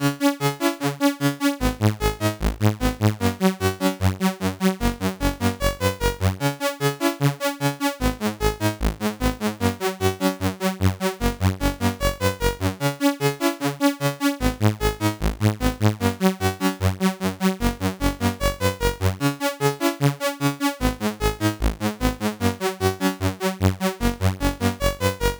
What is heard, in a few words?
c64 in game; lazy jones